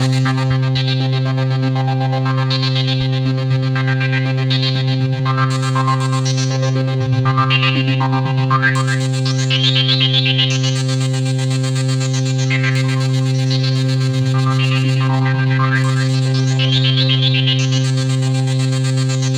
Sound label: Roland JV 2080, poly saw